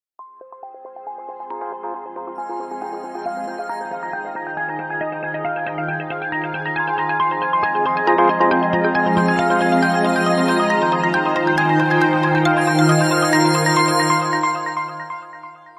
BlackBerry; Torch

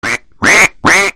Ducks Ducks

